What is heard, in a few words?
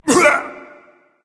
grunt hit berserk; cuchulainn